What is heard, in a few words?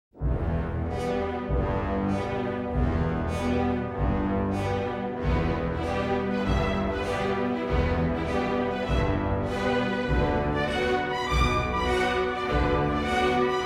elephants; AnimalAntics